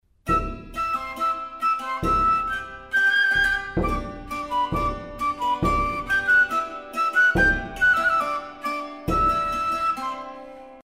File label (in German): Schwegel Klang